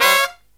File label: HI CHORD, Brass